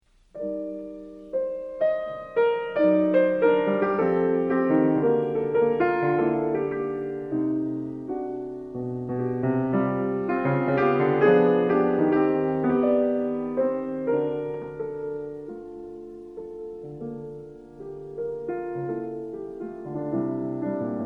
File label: prelude in g min, piano; scriabin; Prelude en b menor